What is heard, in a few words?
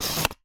Camera Single